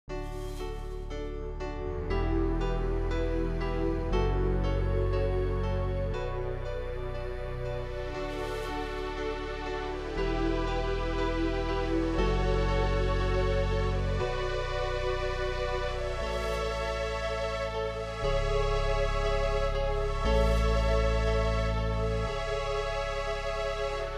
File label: Cinematic Uplifting Atmospheric; amperAI